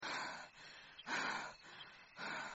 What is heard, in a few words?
girlfriend breathing with maw open